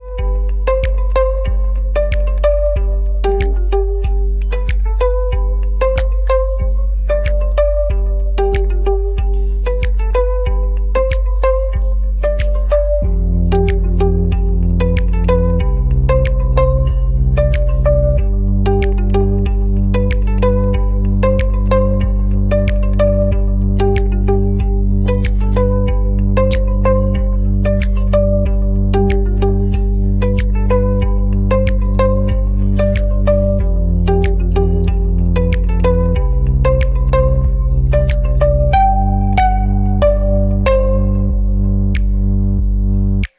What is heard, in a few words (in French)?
piste sans voix